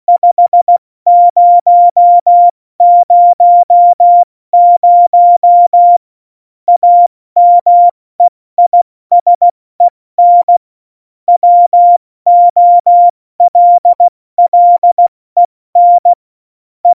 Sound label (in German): Witz, Morsekurs, 70 F80; Witze